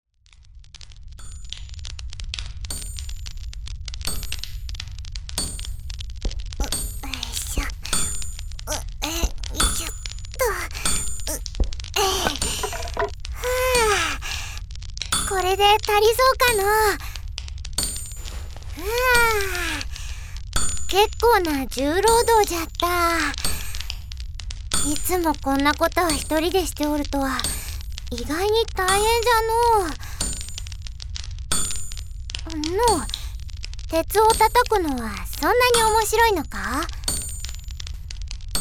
鍛冶; 鍛冶を見る女の子; 鍛冶師の男と竜族の女の子; 恋夢; ＳＥあり

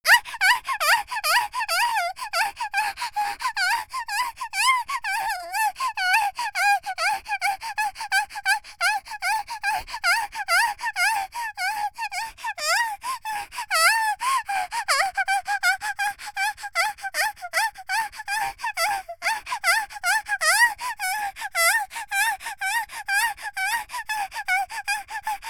「喘ぎ」激しい, 少女; 元気な少女

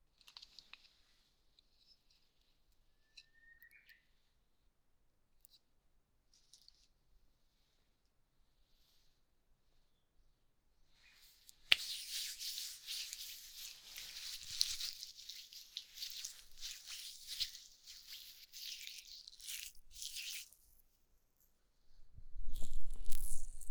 高粘度オイル 春の朝の環境音

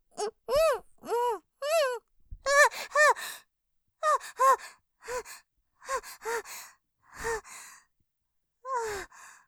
絶頂余韻 荒い息で・意識朦朧と; ビクンビクン; ループで楽しむ！シェフの気まぐれ抜き抜きコース; モノラル

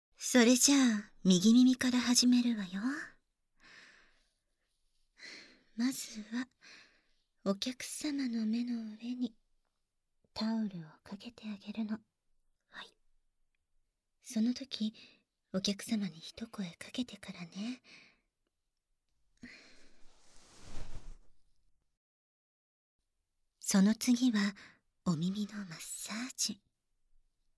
お耳かきかき; 研修タイムばい♪; 冬華さんの施術; お耳かきかき見習いタイムばい♪～山本耳かき店監修～

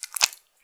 膣内射精後, 引き抜かれた瞬間; ■挿入or引き抜く

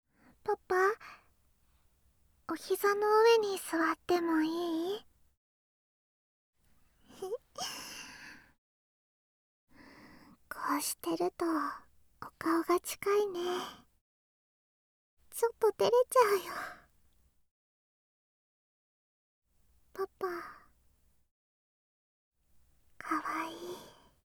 キス

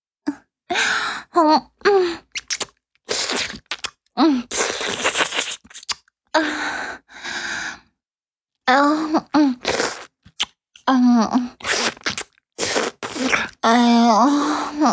お掃除フェラ(弱):陰部のあちこちに垂れる精液を舐める, チュパ音; チュパ音抜粋集

